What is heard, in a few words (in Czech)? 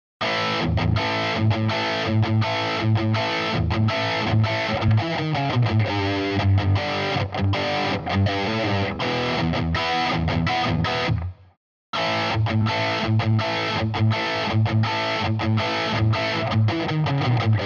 strasatko hard gt, Kytara